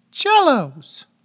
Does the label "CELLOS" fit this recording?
Yes